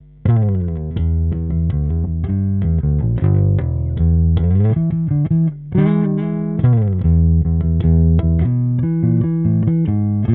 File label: Block 300B neutral Cab